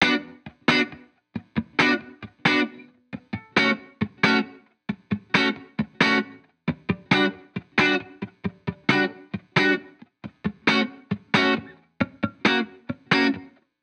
Guitar Bpm135 Key B min, 70s Guitars; Fox Samples - Back to the 70s Guitars

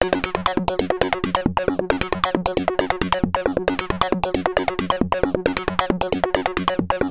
135 Sync Arp, 135bpm; Zero-G Trance Inducer; 90 s Sample CDs

